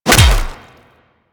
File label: Melee Hammer Impact Flesh; Warframe; Weapons; Hammers